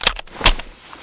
svd clip

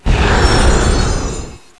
frost explode; cstrike

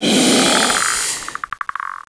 alien pain

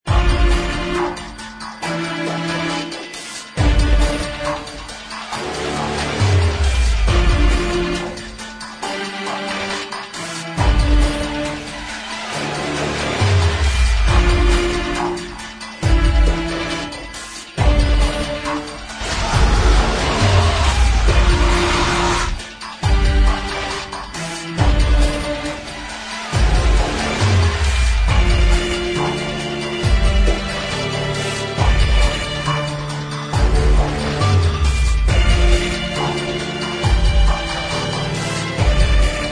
loading; csproject

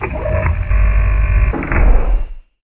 door

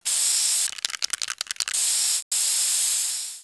spray

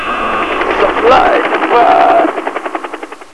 respawn music; zombie plague

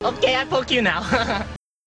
zombie attack; zombie plague

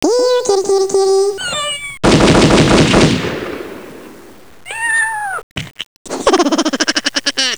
kitty

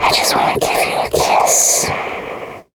Stalker Infected, zombie; zombie plague

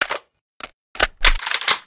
vintorez reload, weapons

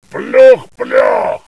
zombie die

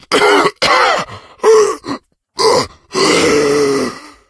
zombie die; zombie plague

